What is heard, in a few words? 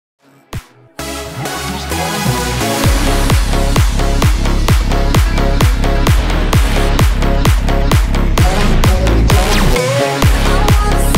130 BPM